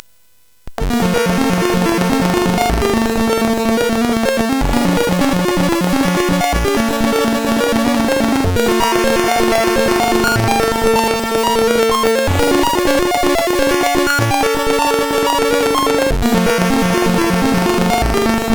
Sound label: sid CSG8580; Softie; hvsc